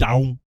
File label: BASS FX, Vocal Planet, Jazz FX; Spectrasonics